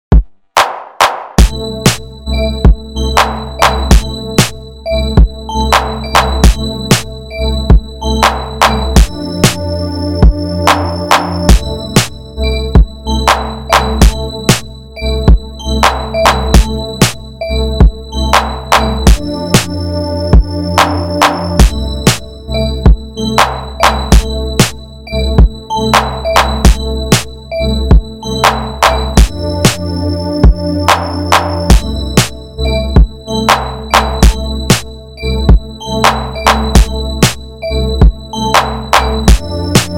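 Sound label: guitar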